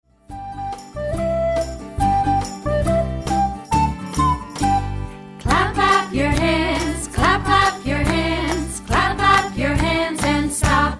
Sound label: Clap and Stop